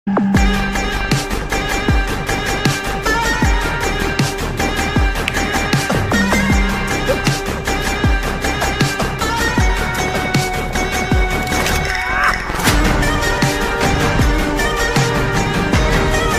glimpse bgm ringtone beat music bgm; surya 44

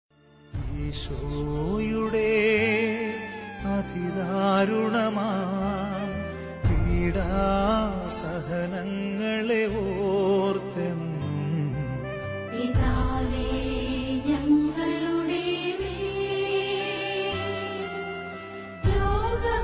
malayalam christian devotional song

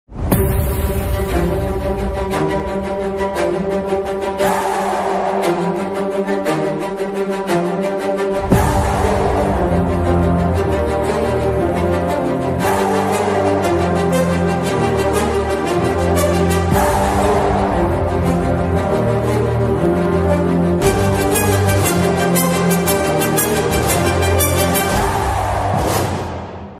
bgm ringtone, telugu bgm ringtones; god father; chiranjeevi

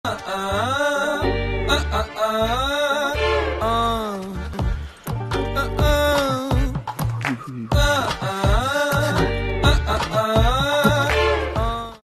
movie love bgm ringtone tamil bgm ringtones; goodnight

